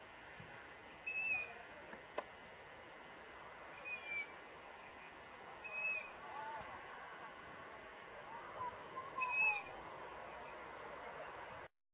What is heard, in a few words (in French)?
moyen duc